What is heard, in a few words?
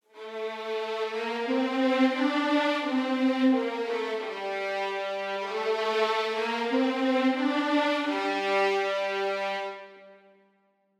Violins Legato; Audio Imperia Areia